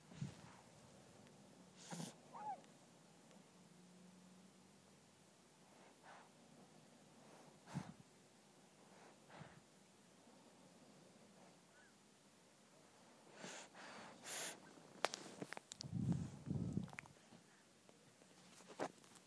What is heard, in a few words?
sound asleep